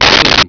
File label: gatling